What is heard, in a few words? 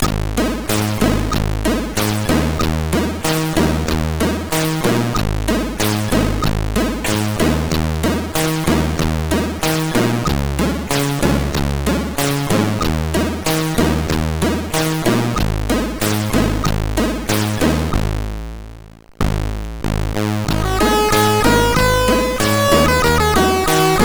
sid CSG8580R5; Alien Syndrome preview